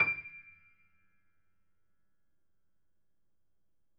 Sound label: piano ff, steinway